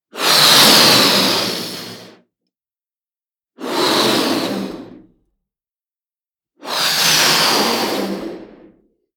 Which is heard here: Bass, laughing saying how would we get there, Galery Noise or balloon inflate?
balloon inflate